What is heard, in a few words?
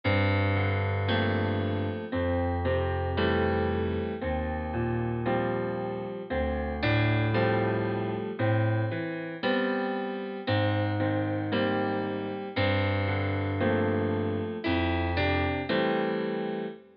beginner piano pieces